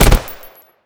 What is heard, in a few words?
basic fire; Smite; Zhong Kui; commando